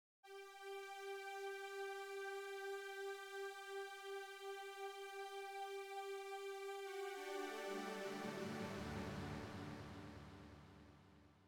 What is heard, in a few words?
leadsynth cue